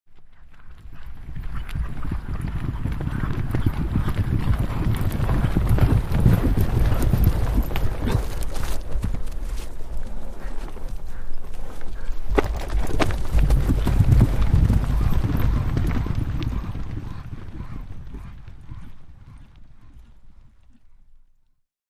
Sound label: 10 Horses Canter Up St